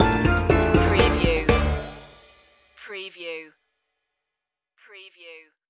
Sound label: tango de luna stinger